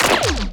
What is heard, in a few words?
krank